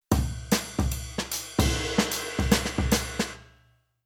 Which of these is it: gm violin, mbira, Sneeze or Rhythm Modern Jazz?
Rhythm Modern Jazz